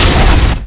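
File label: pulse explosion; RPG Maker; Legend of Heroen v1.20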